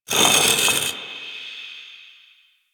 Stone Small Scratches; Ambience

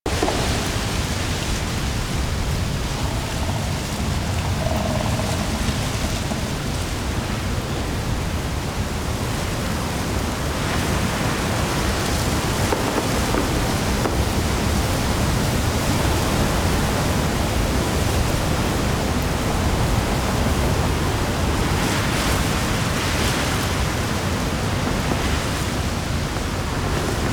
Exterior Foreground Forest Angry Jealous Loop; Ambience; Duviri; Warframe

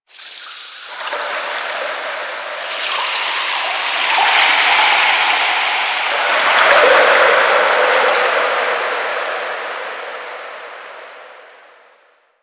Ocean Underwater Texture Small; Ambience; Warframe; Grineer Ocean

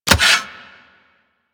Hammer Equip B, Foley; Warframe; Weapons

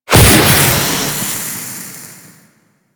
Grineer Space Pod Thruster Burst, Vehicles; Warframe

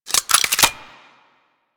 Experiment Rifle Reload; Crp Sent Experimental Riffle

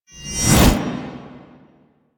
Machete Finisher Accent Whoosh; Warframe; Quests; The New War; Kahl; Weapons

